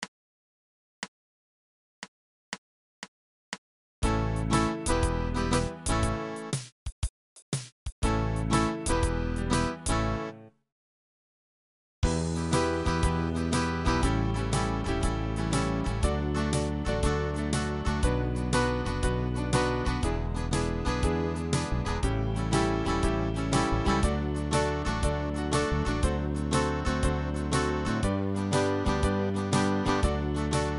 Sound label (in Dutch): Schoollied backingtrack